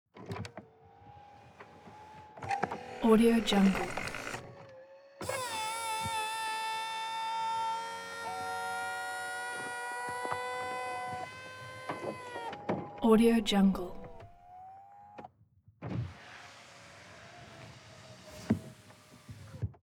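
transportation sports car exterior convertible top close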